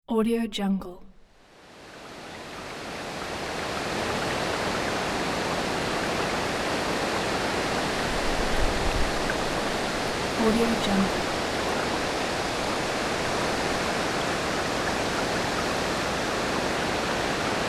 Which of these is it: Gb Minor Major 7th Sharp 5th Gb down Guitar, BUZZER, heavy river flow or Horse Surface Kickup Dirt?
heavy river flow